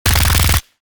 Special forces submachine gun short burst

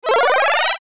Line graph rising animation infographics

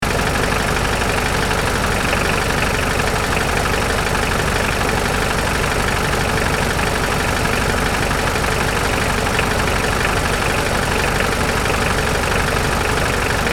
Bulldozer engine idle loop

